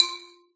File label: brick combo; candy crush saga online